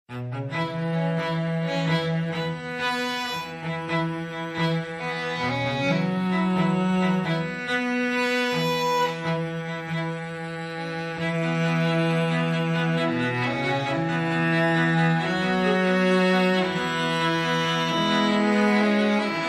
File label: Strings Only